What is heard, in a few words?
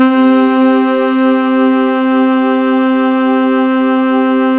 SAXY C3